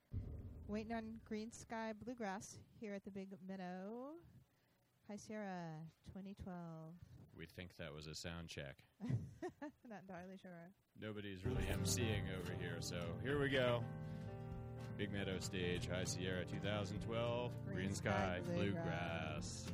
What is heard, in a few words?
Bluegrass; Big Meadow Stage High Sierra Music Festival 2012